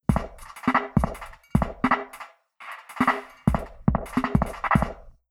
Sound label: drums impulse 10m centre; Dubh Grange